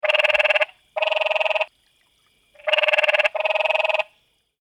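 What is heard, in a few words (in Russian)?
лягушачье кваканье похожее на стрекотание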